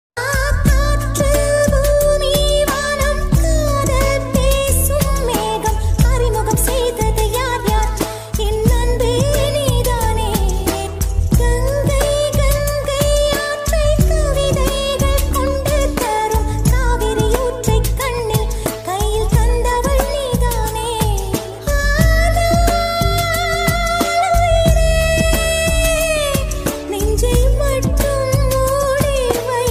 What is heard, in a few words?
Love Ringtone Tamil